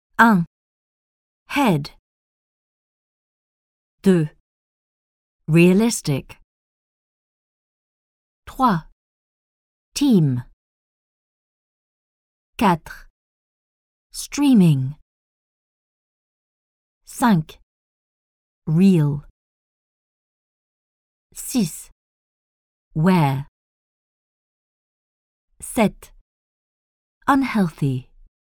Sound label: techno